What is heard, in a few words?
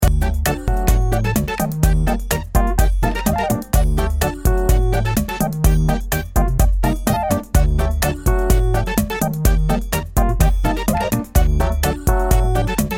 bgm totem cool loop; KarmaZoo; Totem Cool Loop 4